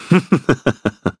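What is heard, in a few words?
Laugh, Korean; Shakmeh; kings raid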